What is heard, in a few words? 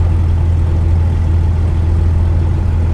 zlin cockpit, engines; War Thunder